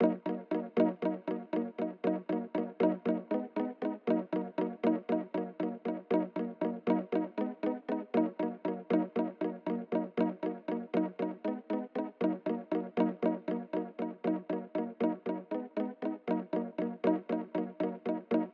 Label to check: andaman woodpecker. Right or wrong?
Wrong